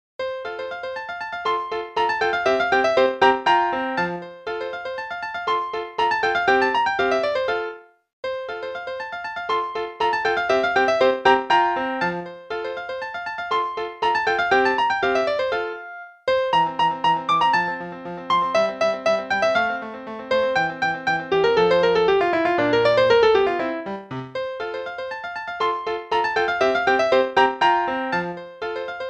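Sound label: Play-along, Scherzo, quarter note=120; Haydn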